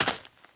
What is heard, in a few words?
gravel, player footsteps survivor walk; source engine; left 4 dead 2